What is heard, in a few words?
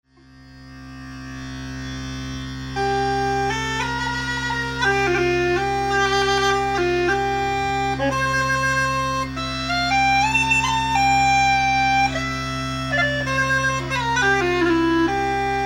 chanter